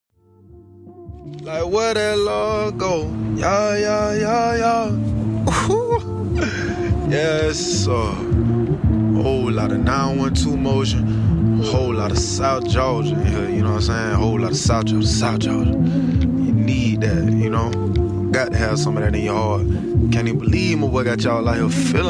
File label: Interlude